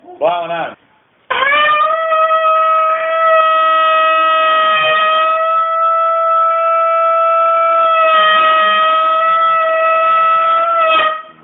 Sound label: blowing home, calls